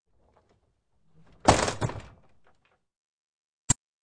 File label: Track, Doors; 헐리웃특수효과음; 문 소리